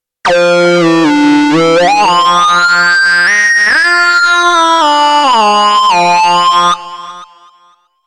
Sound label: Sync Saw LD; KOPRO+